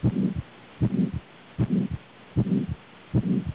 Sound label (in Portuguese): Estenose aortica